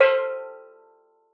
C4, STEEL DRUM; Xsample VOL-4

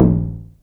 SYNCUSSION

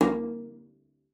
CELLO FINGER A#3; Xsample VOL-5

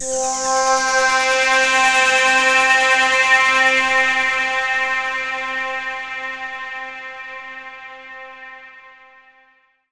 Synth Hits, C3; Zero-G; Soundclash Nu Dance Electro; Acidized

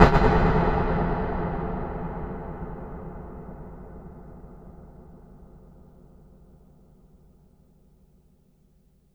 EFX Klang; Polyend; Plughugger

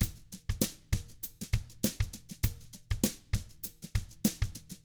FUNKY, Drums; ILIO; Double Platinum Drums 1; 99 FUNKY 1

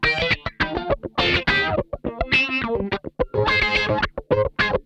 RAW, Funk Guitar; 90 s Sample CDs; Zero G

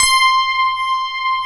FM ELP C5 L, Keyboards Old School; USB Soundscan vol.09 - Keyboards Old School; AKAI

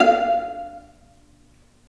STR P, Roland String Master Series, Vlas Pizz

